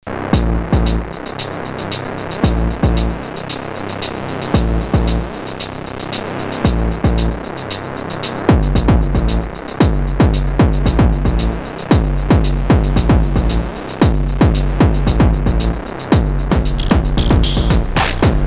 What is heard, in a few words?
distorted shit with po-32 drum loop sampled thru monotron delay for some crunch into DT